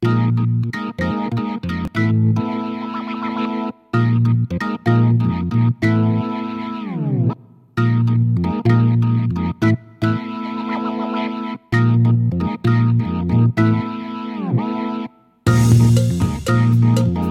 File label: Kronos Wha Pitch Bend; Nicacin